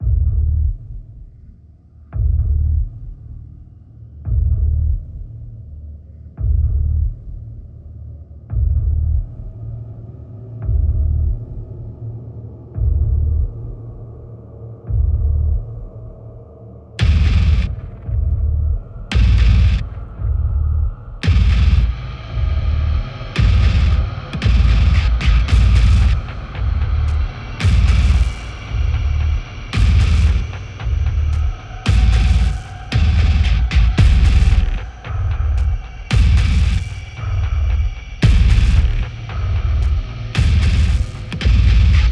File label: Metal Hell Main Ambient; Doom Eternal; PS4; Stadia; Xbox Series X/S; Nekravol